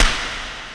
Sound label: SD HOU, Dance Mega Drums; Best Service